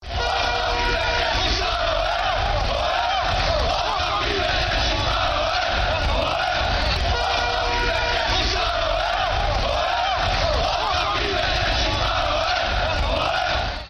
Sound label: albanian football chant